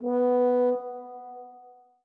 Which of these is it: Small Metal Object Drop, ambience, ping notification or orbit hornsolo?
orbit hornsolo